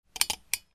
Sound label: CLICKS